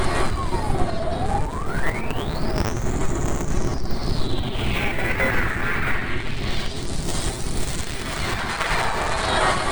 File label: loop, techno atmos